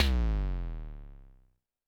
TD-20 Tom B, Roland TD-20 Toms

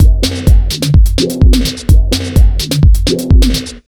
127 BEAT, Disco House

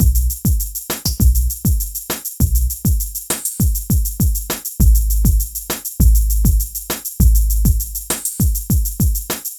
PHATT BEA, Hip Hop

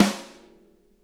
snare, drum kits

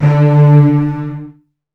Virtuoso Strings, TRM FX

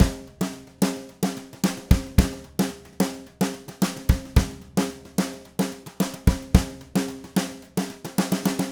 Red Maple Groove 110, DW Red Maple Kit

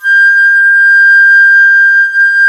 FLT XFLT, Flutes; Club-50 - Foundations Roland

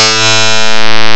DIRT CLAV; M8; CMI Factory

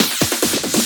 Fills, Loops 140 BPM

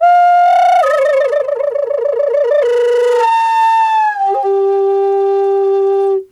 G WILD, FLUTE WILD; Big Fish Audio - Didgeridoo and Other Primitive Instruments

